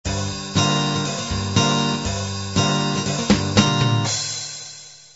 uk pop intro

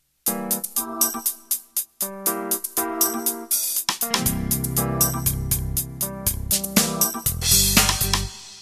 Fusion Intro

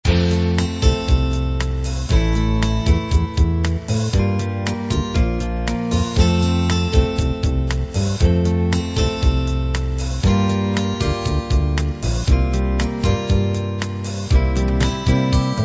ballad

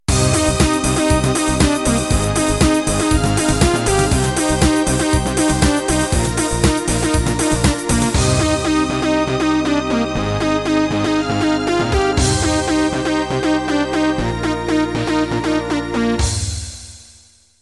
Tekno End

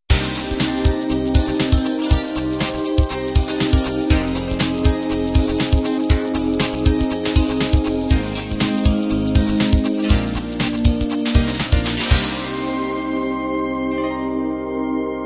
trendy beat end

